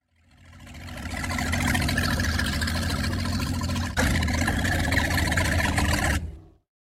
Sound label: Boat Enter